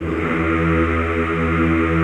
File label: Classical Choir, E1; Best Service; Extended Classical Choir